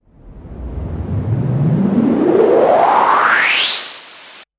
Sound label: noise Modular